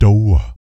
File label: PERC, Vocal, Jazz FX, BASS PERC